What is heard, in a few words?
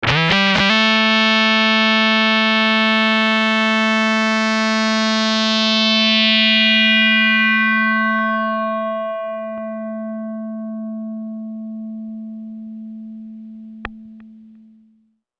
Low Mel